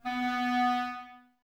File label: clarinets, Wood Winds